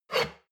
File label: shot glass grab table slide hard, gastronomie; berufe; soundpool